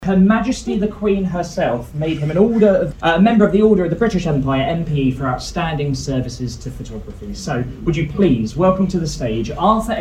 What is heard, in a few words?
intro and clapping